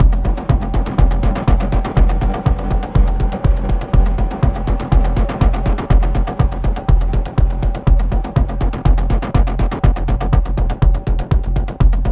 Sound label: pulse